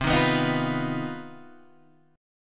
C Minor Major 7th Double Flat 5th C down Guitar Standard; strummed; JGuitar